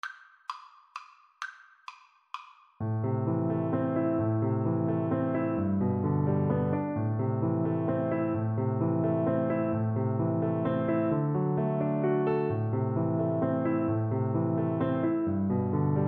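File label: scarborough fair, glockenspiel; Percussion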